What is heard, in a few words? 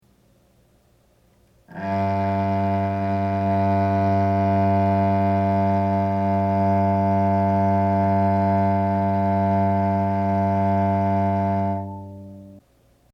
Cello G; tuneguide